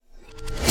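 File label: Physics Object Saw Impact Reverse; Sx Physics-Object Saw Impact Rev 01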